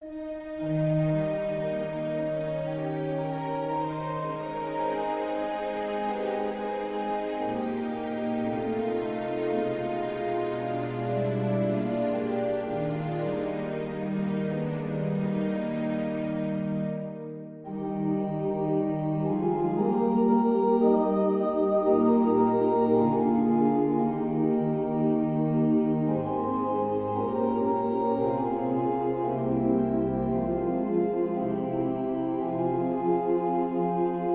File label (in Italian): per grande organo; erkel ferenc; hungarian national anthem trascrizione da concerto